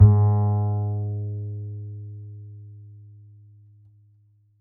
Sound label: D string upright bass; Upright Acoustic Bass